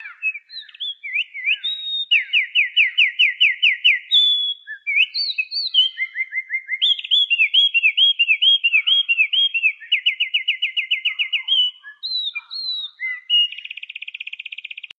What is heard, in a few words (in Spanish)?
canto de pajaro